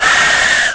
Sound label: Attack, Aliens; Watcher